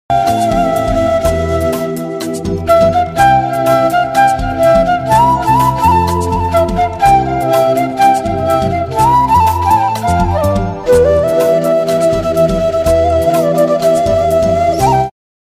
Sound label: love bgm song flute instrumental